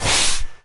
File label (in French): Couvercle Out